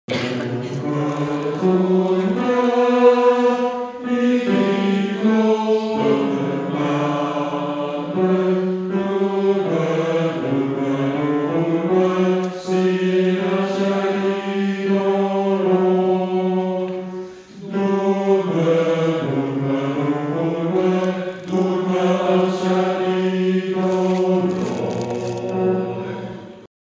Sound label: Durme Durme Bas Tenor